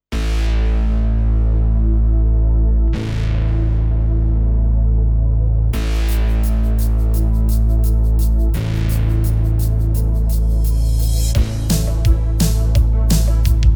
Korg Pa5X Style

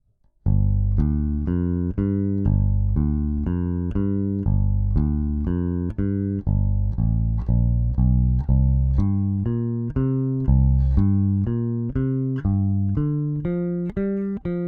AER AMP One Two Three Tone Balance light

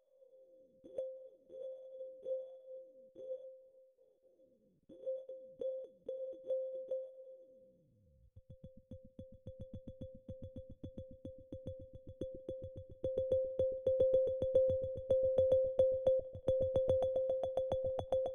EXPRESSIVE E OSMOSE Zenpercs Zonen

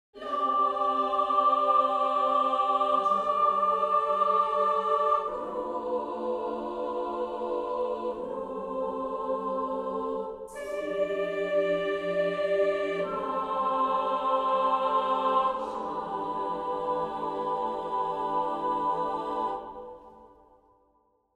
Syllable Preset; Strezov Sampling Freyja

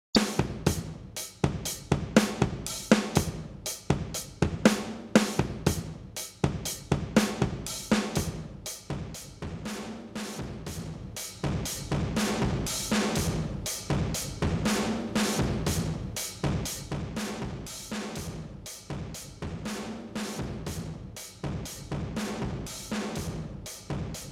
70s Tape Sat

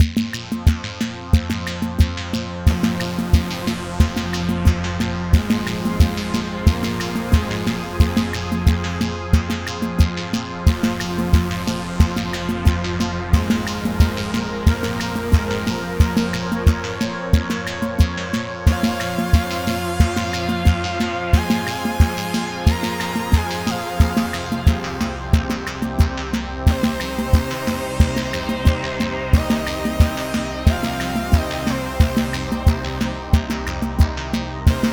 nitron

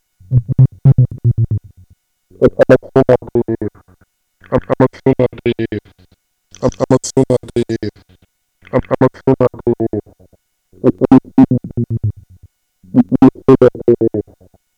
Pocket Operator PO-133; Teenage Engineering

